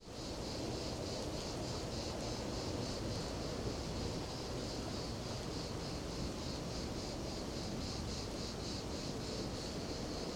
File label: Thick Ambience Day Thunder Crickets; Imperia